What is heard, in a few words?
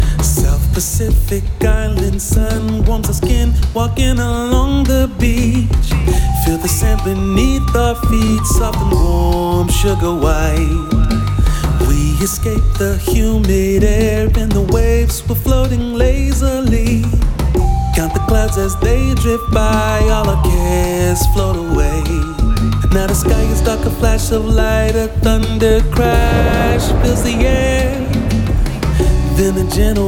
Bossa